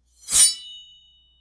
metal sword swing

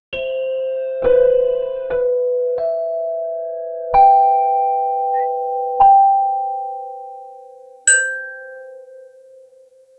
relaxing tropical steelpa

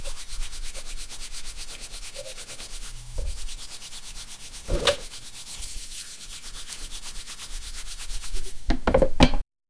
painting studio ambience

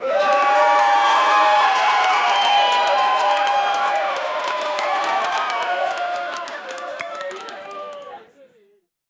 a realistic high energy t, theatre

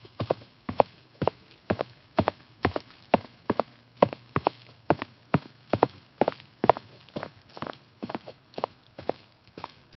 dry sound of a horse

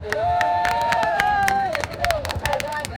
fire crackling in a crowd, festival

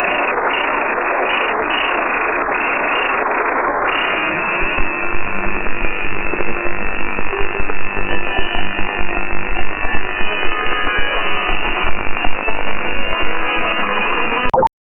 retro radio tuning effect; 80s

